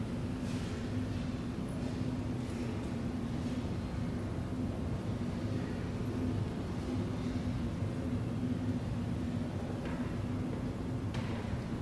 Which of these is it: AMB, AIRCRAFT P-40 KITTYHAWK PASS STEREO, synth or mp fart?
AMB